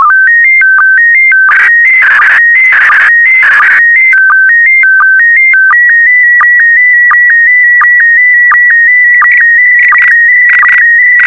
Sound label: sstv; VK4MSL